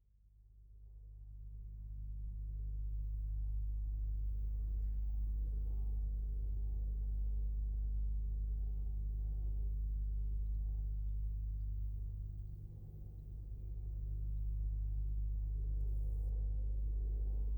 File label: Grain Chimney